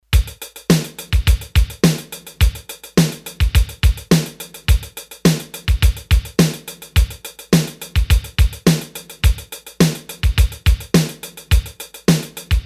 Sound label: 16Beat